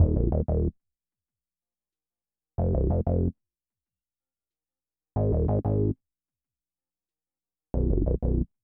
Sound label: Bass